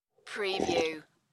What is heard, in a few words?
Sip